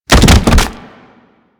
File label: Infested Runner Bodyfall; Warframe; Runners; Foley